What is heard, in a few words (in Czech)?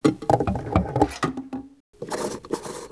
pumpa; Mafie 1